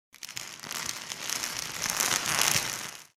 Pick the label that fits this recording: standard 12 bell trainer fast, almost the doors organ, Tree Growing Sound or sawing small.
Tree Growing Sound